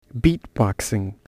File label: beatboxing